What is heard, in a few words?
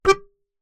Melodica Short Note